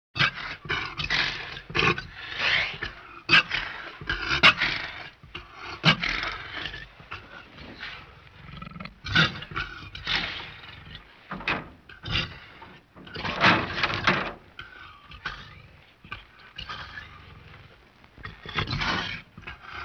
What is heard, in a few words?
tiger growling